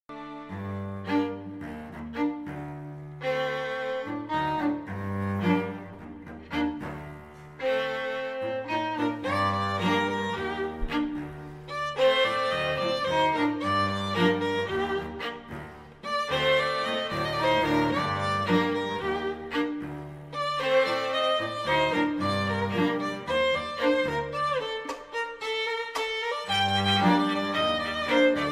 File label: quartet